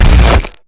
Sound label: plasma blast